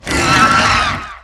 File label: confirm; ashbite